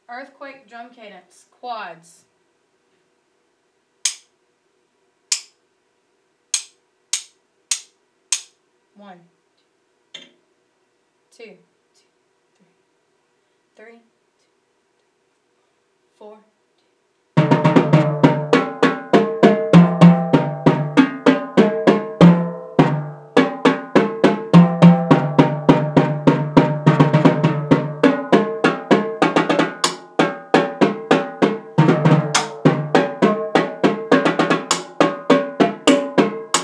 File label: drum cadence quads; earthquake